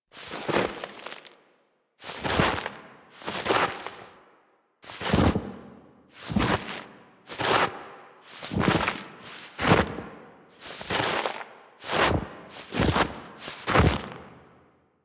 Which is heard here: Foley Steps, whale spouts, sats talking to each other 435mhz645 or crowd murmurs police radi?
Foley Steps